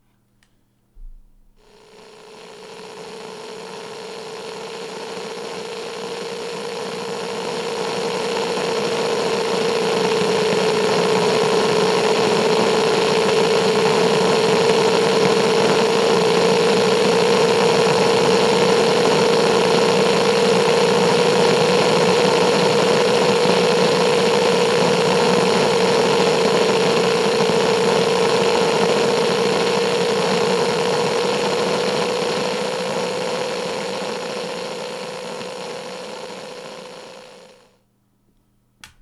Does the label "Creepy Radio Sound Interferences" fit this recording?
Yes